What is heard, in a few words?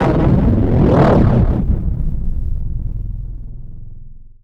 HITS, Special FX Twisted; USB Soundscan; AKAI